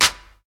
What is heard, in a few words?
Clap C# Key, Royality free hand clap sample tuned to the C# note. Loudest frequency: 4243H; single hits one shots